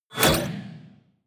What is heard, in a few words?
beam